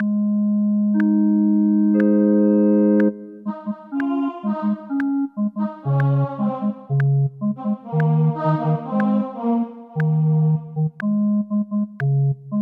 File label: O clap your hands; Gibbons